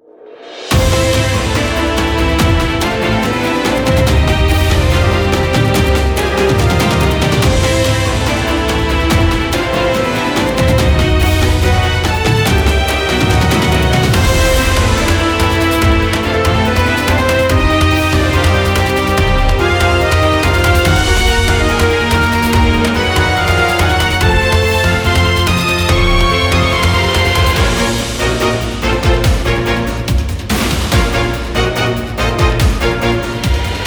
Bgm Battle Ice; Yu-Gi-Oh! Cross Duel

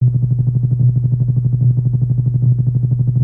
Pickup Idle

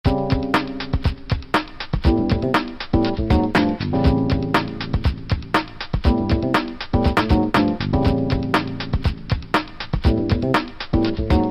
Menu Characters; Diabolik The Original Sin; PS2; PSP; Wii

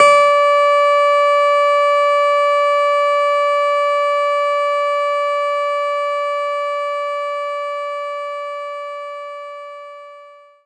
Clavinet D5

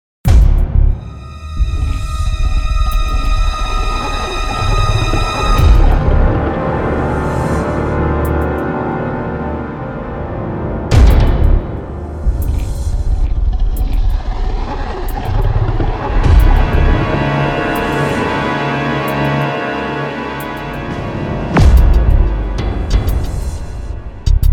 horror, music; Eternal Darkness Requiem

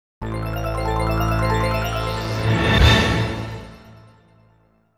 Logo (Sega Saturn Version); ADK

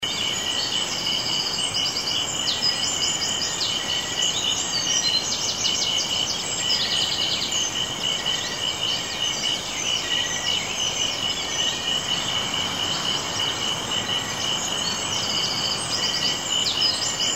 ambient jungle

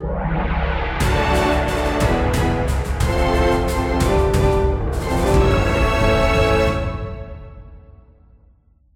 UI Quest Success; bellatia

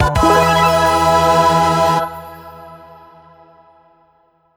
Jingle Super Win; pang adventures

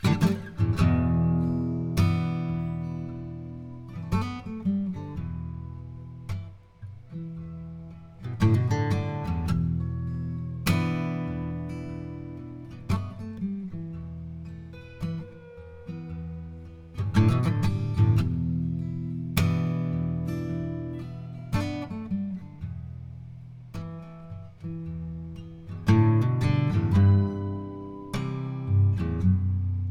Ghost Blues; Rise of the Third Power

